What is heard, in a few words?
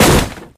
land; taunt; yeti; source engine; tf